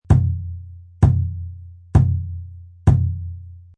Loop Low Hand Drum Beat 130, Hand Drum Loop At 130Bpm.; Percussion Loops; Low Hand Drum Beat5 Loop 130Bpm